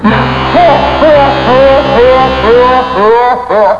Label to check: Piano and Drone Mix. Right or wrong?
Wrong